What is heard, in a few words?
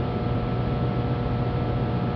Hum; zps; Ambient; Hums